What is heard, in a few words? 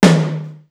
Simmons SDS2000; SDS2000 Factory5